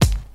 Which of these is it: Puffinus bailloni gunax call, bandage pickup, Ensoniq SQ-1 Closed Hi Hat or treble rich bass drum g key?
treble rich bass drum g key